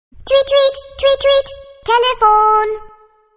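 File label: Tweet tweet telephone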